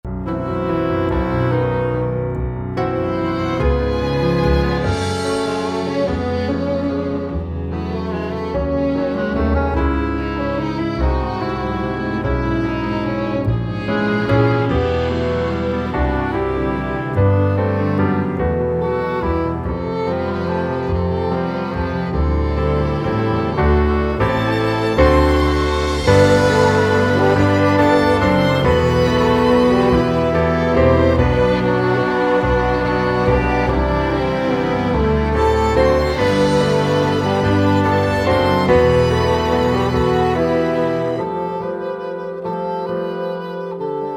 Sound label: Audio Instrumental; audition